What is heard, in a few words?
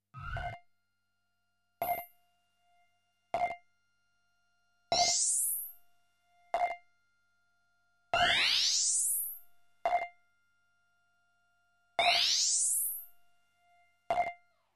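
radar sound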